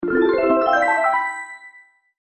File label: success